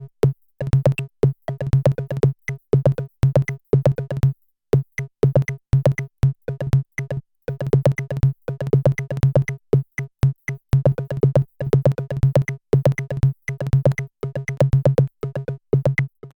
randomRhythm Stacked CV Sequence Variation

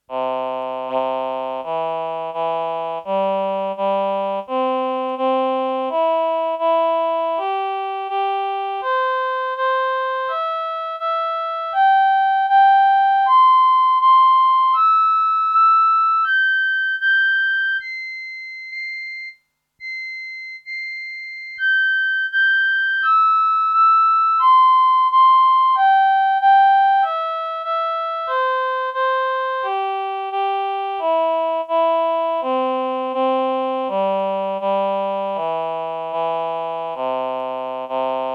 VP330 CS340 Male Female4 roh

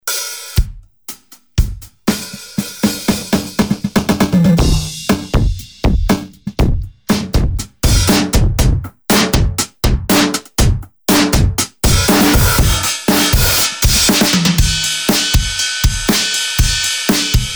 Quadrafuzz

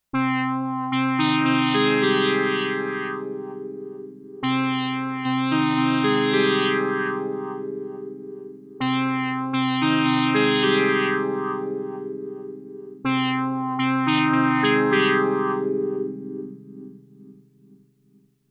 microfreak V4 Presets

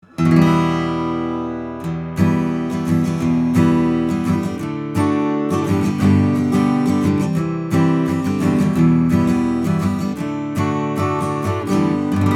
Omni Acoustic Guitar 50cm 15thFret; Neumann U87

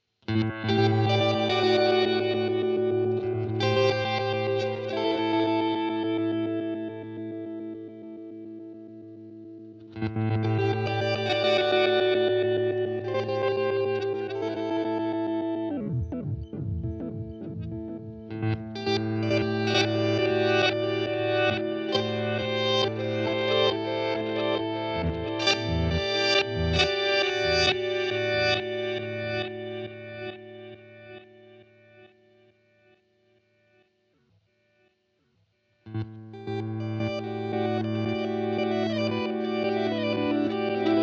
Indie Reverse Delay riff; VALETON Dapper

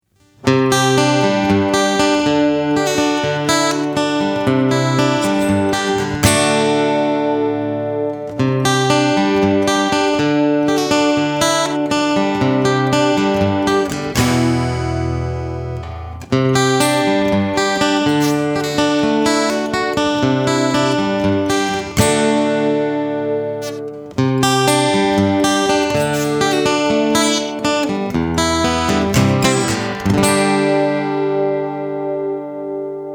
Chorus

